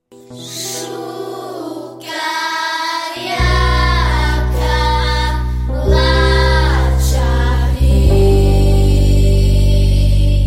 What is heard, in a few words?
Children s Choir